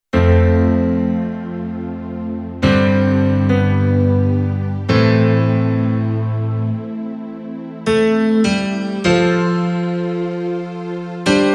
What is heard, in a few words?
Romance Layers